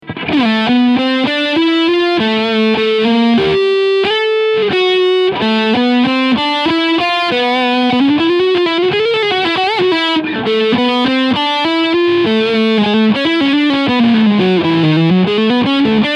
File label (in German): FGN Neo Classic LC20 Lead Sound Steg Humbucker